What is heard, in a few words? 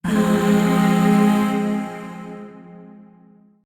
Shawzin Phrygian Chord; Warframe; Zariman; Void Shawzin; Chords